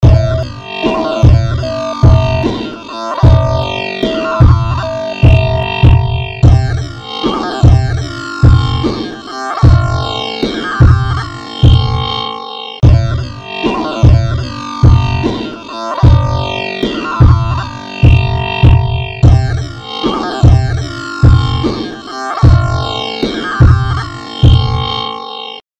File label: SINGLE SINE WAVE TEST